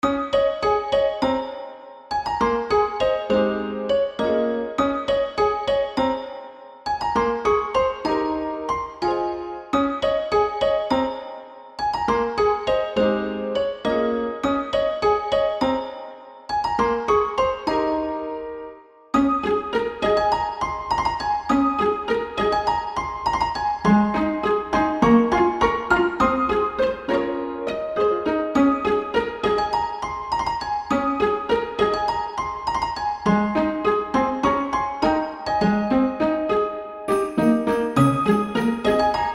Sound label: music